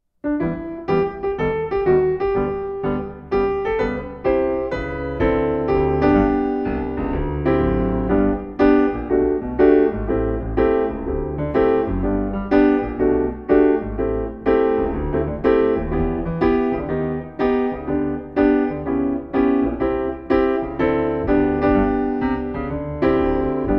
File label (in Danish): tre tulipaner piano akkompagnement; DR Musikariet; Skolernes Sangdag